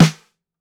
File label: SWUNG SNARE; Basement drums vol 2